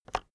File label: keyboard external enter release